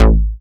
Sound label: bass attack, techno trance; Ueberschall; Techno Trance Essentials